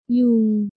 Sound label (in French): Moustique